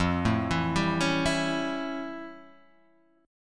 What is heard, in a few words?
F Augmented Major 9th F Guitar Drop D